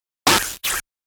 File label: fast attack; Fast Attack Hiss Blip; Warped; Blastwave